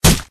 Body Hit

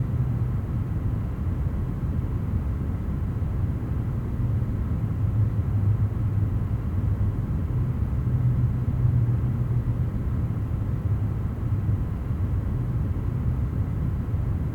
Ambience Laboratorio Sos Loop

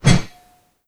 Heavy sword woosh

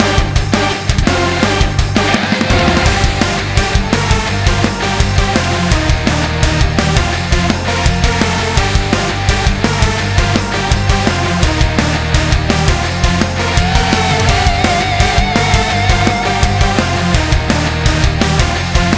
Battle

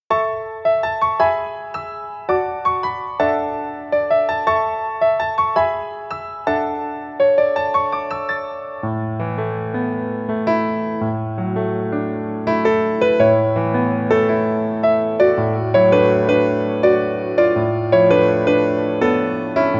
piano Ver

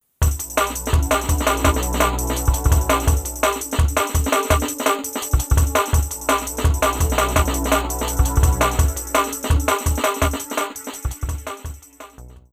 Drum N Bass E Energy; uWordsmith; Wii U gamerip 2015

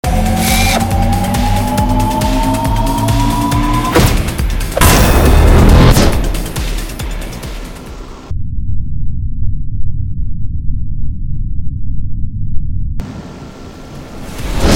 Baseball Bat; Finishing Move

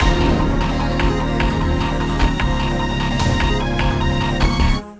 BGM STAGE BATTLE; Phantasy Star Zero

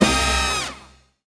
Tennis Hit; Party Crasher - Combo

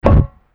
walk metal; gamerip; shattered horizon 2009

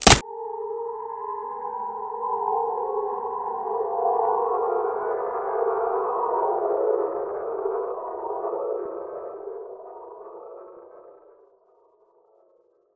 blow umbrella; Incredible Adventures of My Mom

